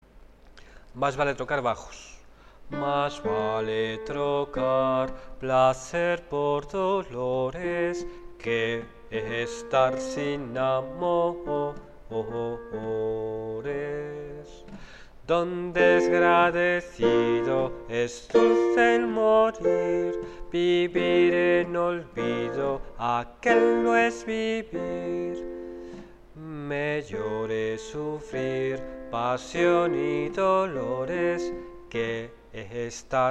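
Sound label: bass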